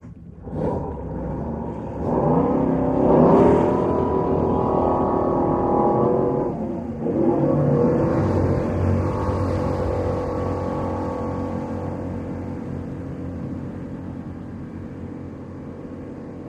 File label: Military PBR Startup